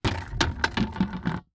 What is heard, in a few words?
Impact Bucket Drop Invert; Impacts